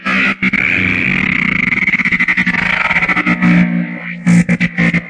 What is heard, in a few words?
transforming robot distorted halo